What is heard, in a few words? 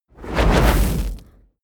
whoosh fire sizzle small